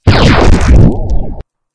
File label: bubbles falling